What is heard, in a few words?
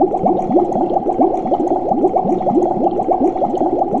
bubble